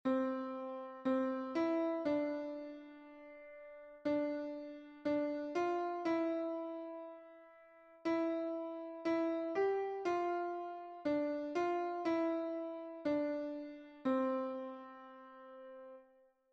Deus e Tao Bom Melodia

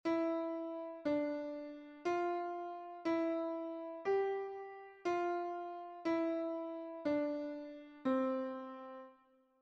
Melodia; Pequeno Verso

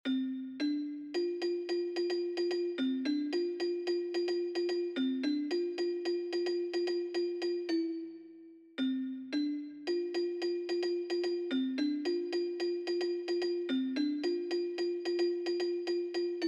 Melodia

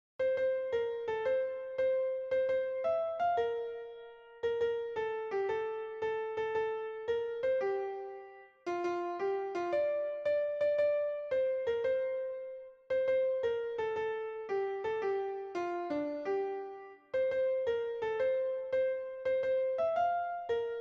Natal Todo Dia Melodia

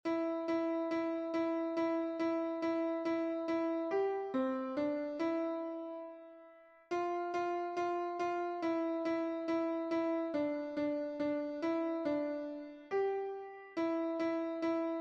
Bate o Sino Pequenino Melodia

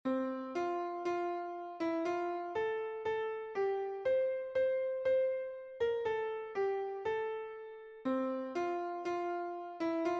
Natal Melodia

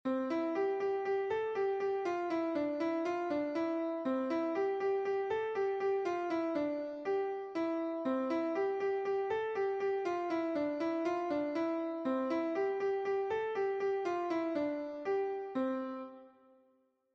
Eu Sou Pobre Melodia

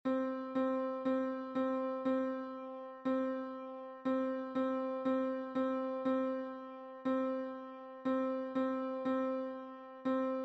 DO Doce Domino. Melodia

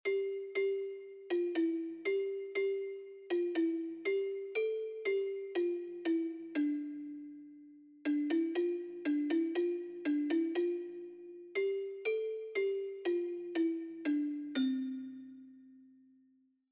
Cai Cai Balao Melodia

